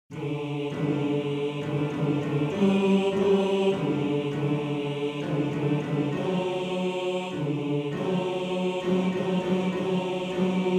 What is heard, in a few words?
Bass; Bajazzo; Bass 1